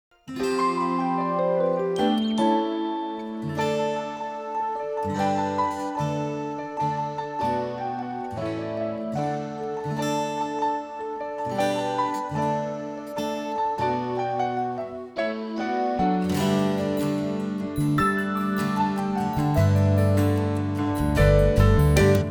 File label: instrumental audition cut